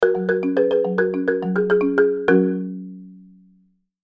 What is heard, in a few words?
marimba ringtone